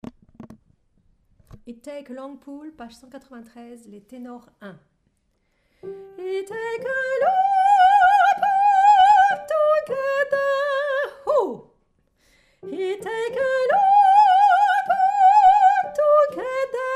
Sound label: Tenor; take a long pull